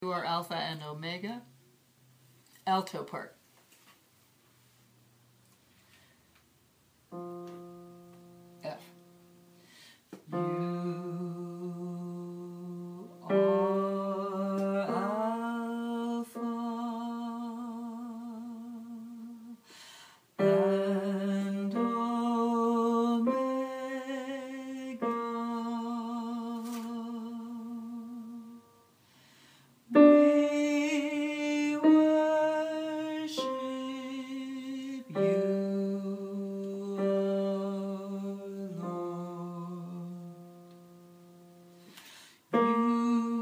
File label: alto